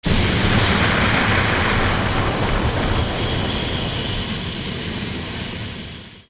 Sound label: Fire Ground; OOT